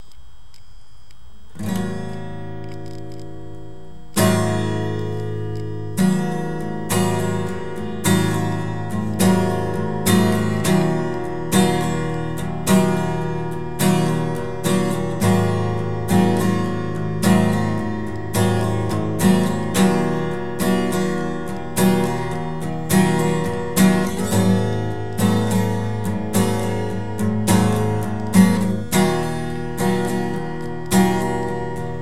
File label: acoustic riff